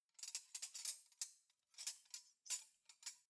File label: cutlery knives handle long soft